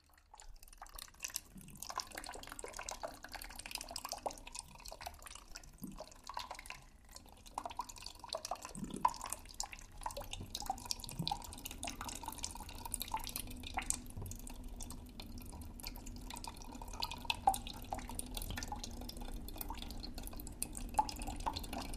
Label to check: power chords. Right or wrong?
Wrong